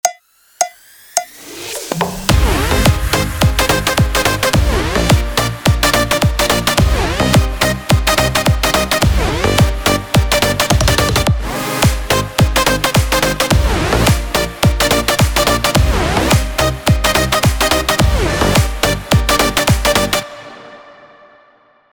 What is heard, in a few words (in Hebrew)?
דרופ תכף יפתח